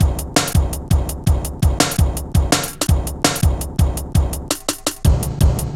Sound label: Bouncy, Jungle, Drum Loops 166.5